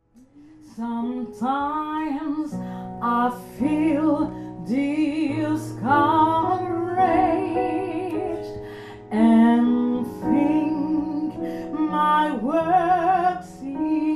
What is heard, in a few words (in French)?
couplet, gospel; couplet 1